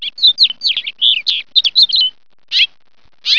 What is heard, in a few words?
house finch